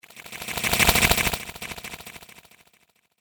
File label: Mechanical Space Termites, science fiction sound effect; Science Fiction Sound Effects; star wars / trek style games; Andrew Fleming